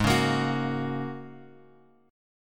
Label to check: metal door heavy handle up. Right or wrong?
Wrong